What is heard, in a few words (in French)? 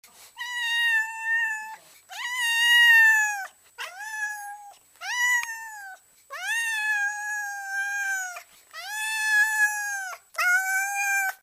MIAULEMENT BUFFLE